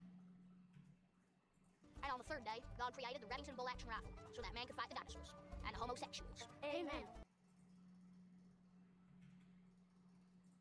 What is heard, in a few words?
amen; remington